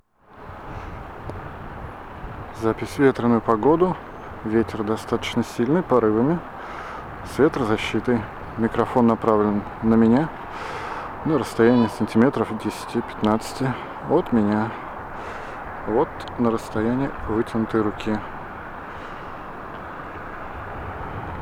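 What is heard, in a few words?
mke200 wind with protection, микрофон для камеры и смартфона; Sennheiser MKE 200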